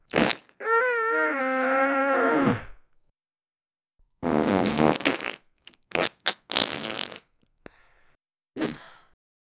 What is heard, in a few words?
an obese woman gorging